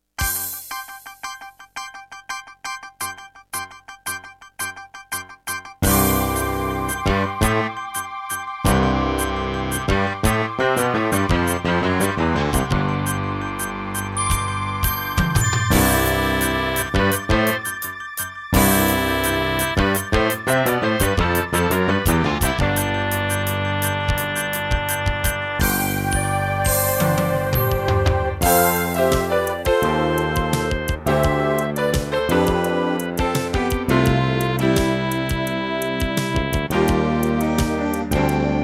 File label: Terratec MiniWaveSystem TTWT